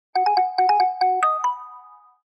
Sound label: Short Tone; Samsung GT-S5150 Diva folder